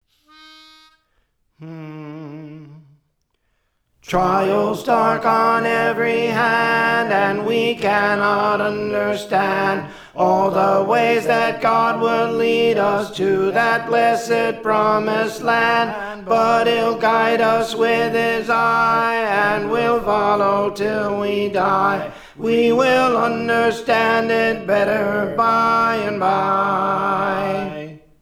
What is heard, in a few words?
Tenor; Trials Dark on Every Hand; Praise For The Lord Training